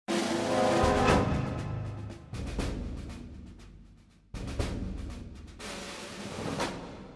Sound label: SHOCK; F.E.A.R. Perseus Mandate